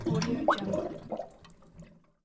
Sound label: bubble in water